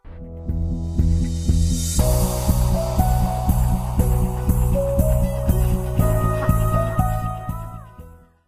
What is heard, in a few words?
cool boss